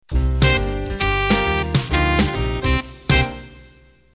Club Jazz End

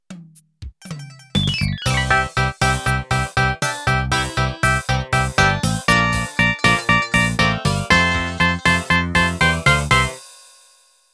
Country Rock Intro

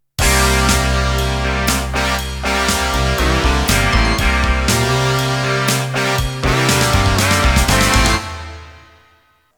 Open Rock Intro

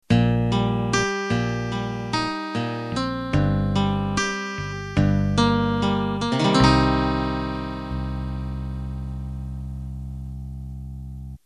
8Bt Standard End

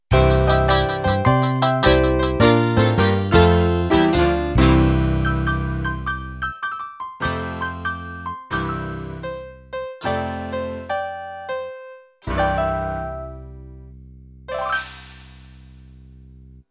Rockabilly End

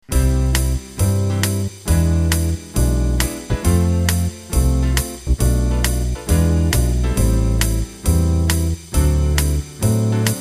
Club Jazz

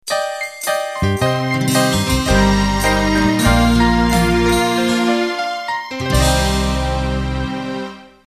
70s Radio Pop End, MIDI; #70R Pop.sty compatible MIDI file Intros and Endings

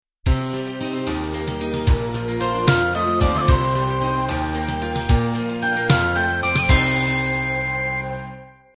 celtic ballad end

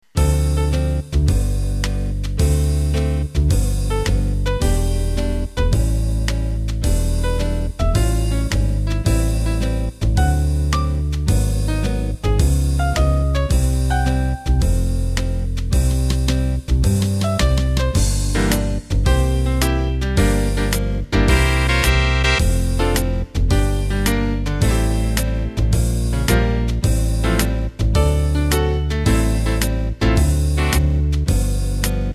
Cool Jazz; Acoustic Bass, Acoustic Piano, Nylon Guitar, Saxes and Brushed Drums

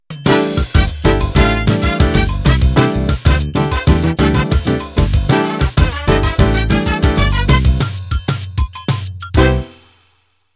Samba De Sol End

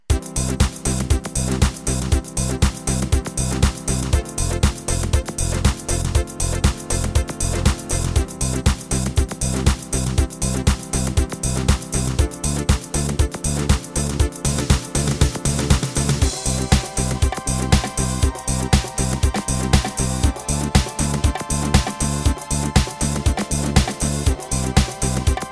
House

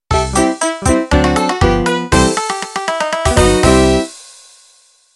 Bluegrass end

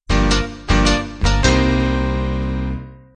60s pop end

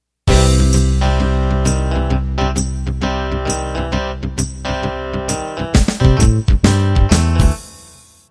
Country Shuffle Intro

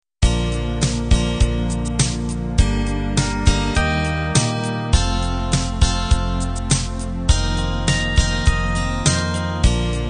pop ballad

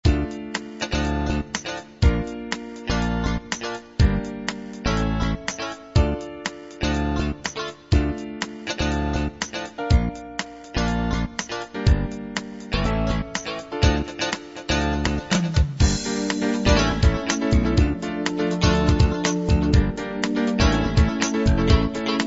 smooth jazz

